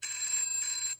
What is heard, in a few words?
motorola krzr k1 ringtones